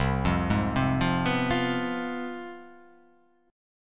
C Major 7th Suspended 4th Sharp 5th, down

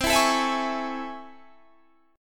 A Major 7th C; strummed; JGuitar

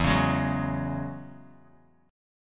E 6th Add 9th E down Bass Standard; E6add9 strummed; JGuitar

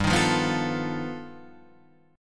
G Augmented 9th

